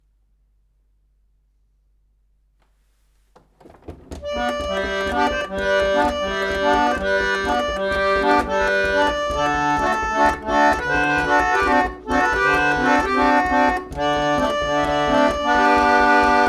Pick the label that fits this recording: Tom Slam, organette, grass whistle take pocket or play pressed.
organette